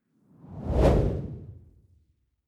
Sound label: whoosh simple hard long